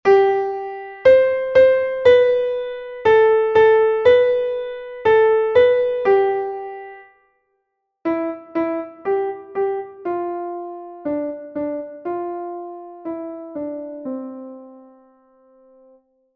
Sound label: diminuendo